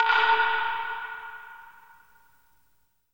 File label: J PERC, JAPAN PRC; USB Soundscan vol.60; Asian Traditions; AKAI